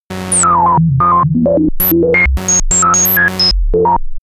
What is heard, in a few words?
working robot; roboter; comedy-cartoon; soundpool